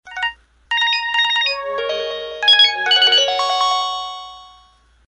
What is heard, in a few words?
sms